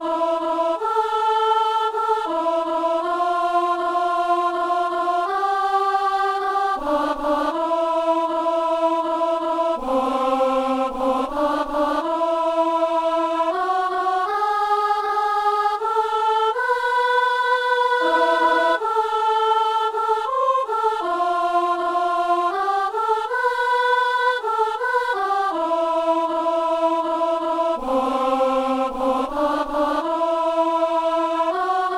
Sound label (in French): soprano et petit choeur